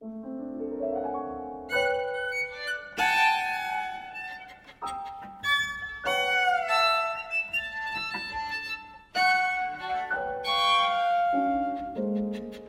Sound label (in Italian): per violino violoncello e pianoforte